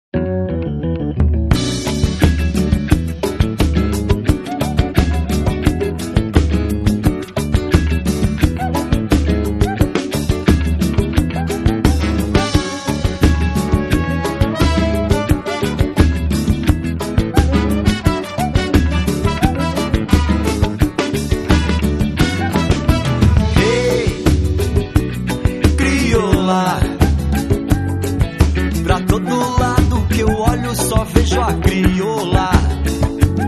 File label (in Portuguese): samba swing